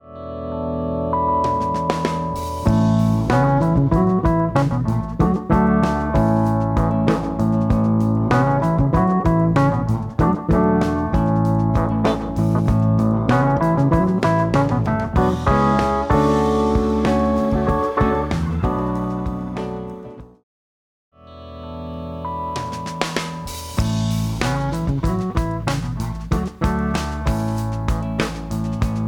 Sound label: mix muddy good, A muddy, boomy mix that gets cleaned up; Mixing for God audio examples